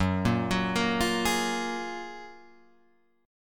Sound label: Gb Major 9th, down, Guitar, Open G